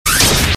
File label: wire hit